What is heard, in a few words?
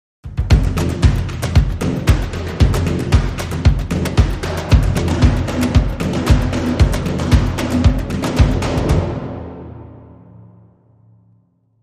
Tribal